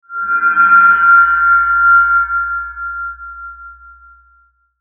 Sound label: METALLIC HIGH, Metallic High Frequency Feedback Swell; Metallic Sound; Metallic Sound Sound Effects